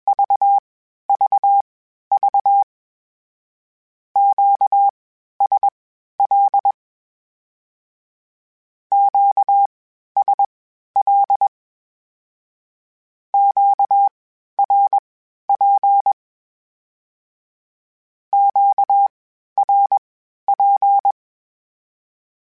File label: Q Calls Twice spaced, Morse Code; HamRadio